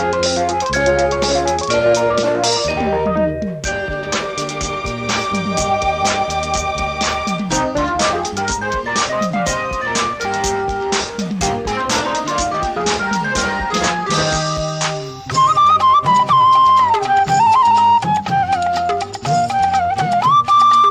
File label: tamil ringtone